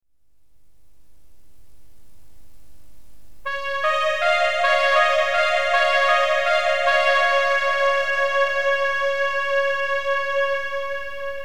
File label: freedom fanfare